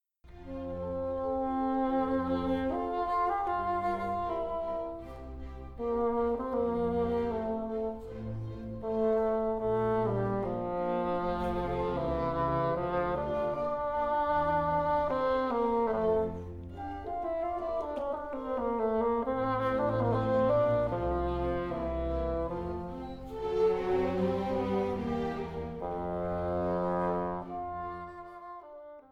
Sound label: Fagotto Con Bf